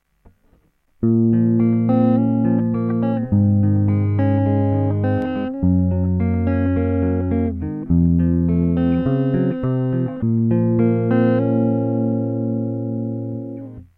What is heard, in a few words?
clean, guitars